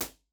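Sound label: tom c impulse response, studio live room drum set; genesis 6 studio live room drum set; b-format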